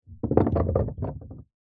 wood small heavy dense piece roll on wooden floor; foley

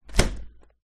Window Shut

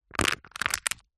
household plastic cup disposable scrunch up

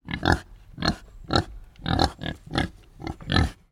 pigs kunekune x2 grunt snort

